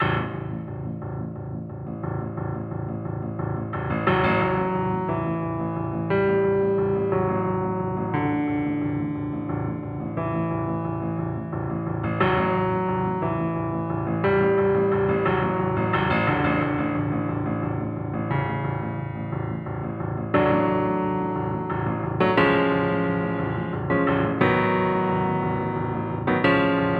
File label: Lava Landing; epicyarn